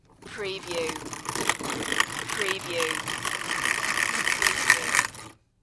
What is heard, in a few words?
Pencil Sharpener